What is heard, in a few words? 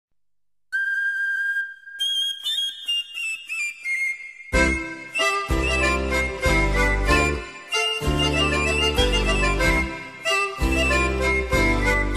Polka